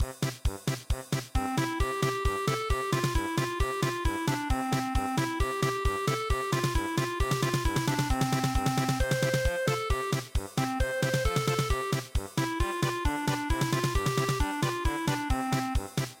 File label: polka; Sarasponda